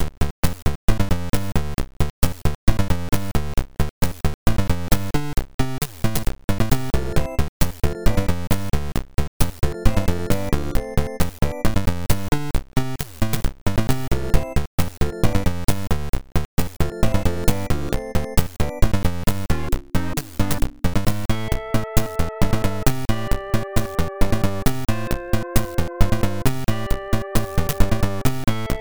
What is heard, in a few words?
Round BGM; Logical; PC-98